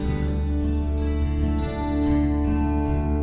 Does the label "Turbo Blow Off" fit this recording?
No